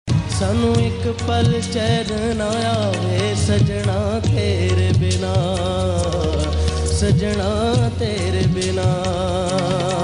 Sad Miss Tone

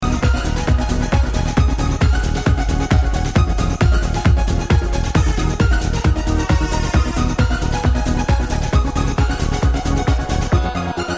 Jazz

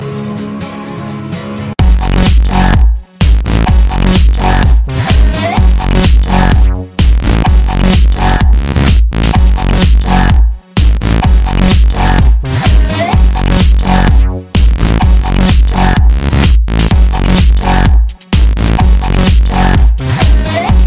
Electro Girl

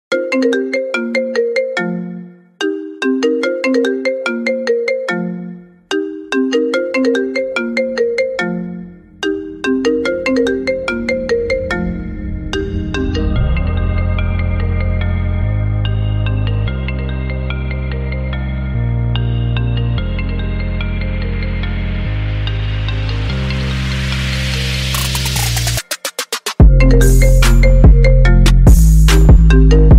IPhone Ring Remix

